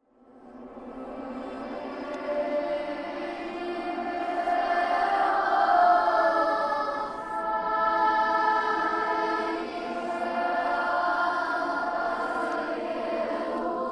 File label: creepy possessed children singing horror sounds